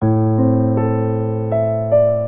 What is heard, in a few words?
Ostinato Limping 6/4 A Minor 158 bpm, Grand Piano; MIDI Clips; Ableton